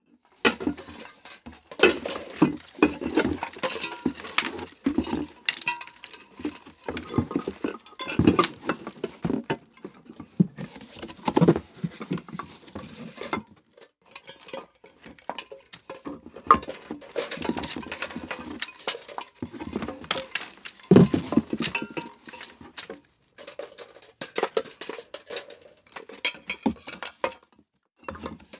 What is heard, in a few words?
Household Kitchen Pots And Pans Movement Long